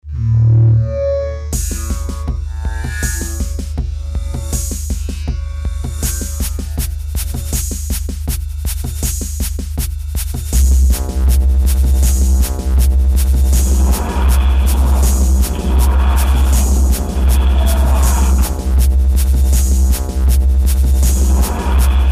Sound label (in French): Basses